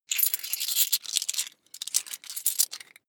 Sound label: coins big clatter fast long